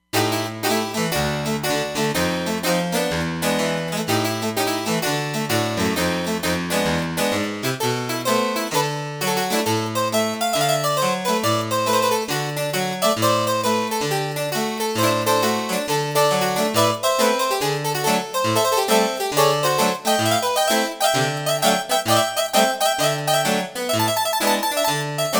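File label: Harpsichord, Roland Fantom XR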